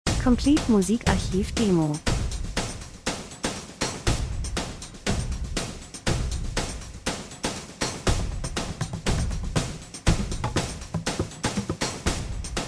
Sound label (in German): drums, rhythmus; Rhythmus Schlagzeug gleichförmig Fernweh Reisen Akzente Land und Leute Reisefilm, Natur Dokumentation